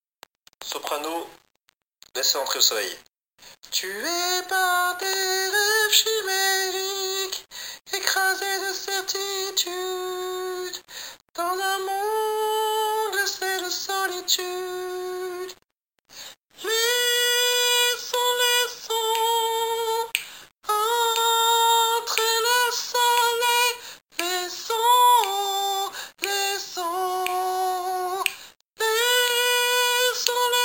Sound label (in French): Soprano